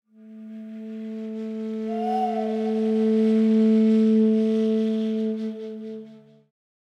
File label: Web Landing Sound